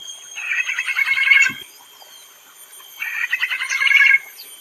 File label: Black Magpie calls, Ulu Kinta Forest Reserve Ipoh Perak Malaysia